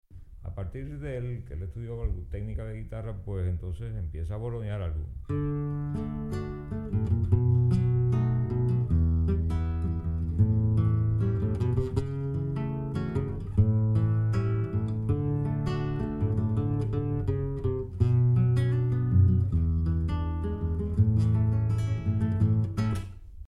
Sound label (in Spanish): bordonear